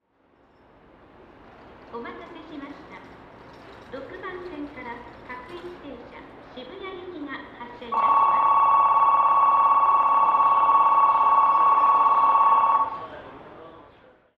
sakuragicho bell, station; tokyu

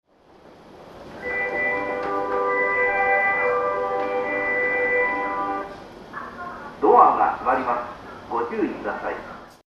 melody

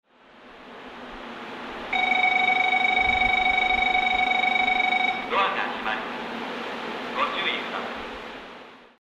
bell

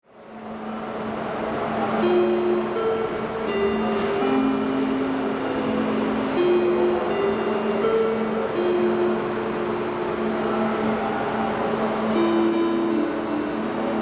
melody, station

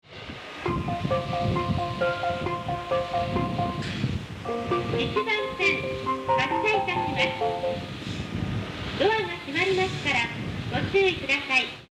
melody, keihintohoku

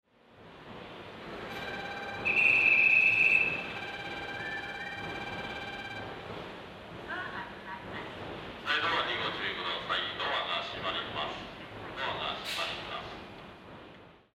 kyobashi bell; jrwest

